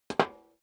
step high heels metal dry single stair up; schritte

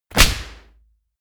clap, percussion

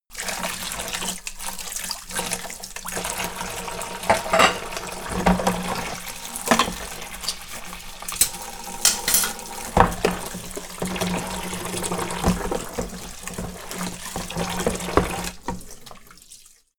dishes sequence long, gastronomie; berufe

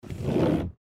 door sliding close

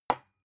glass put down; objekte

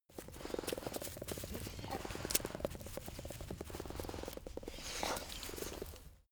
dog teeth chatter; natur-tiere; hunde

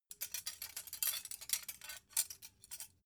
dough hook handle; industrie-wirtschaft; gastronomie

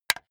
wood board hit hard; objekte; holz

